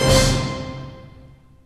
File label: TINE STRINGS